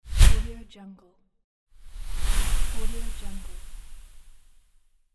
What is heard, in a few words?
whoosh transition; just max